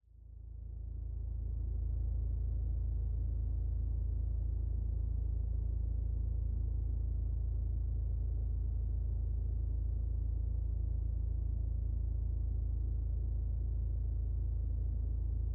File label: sanctuary ambient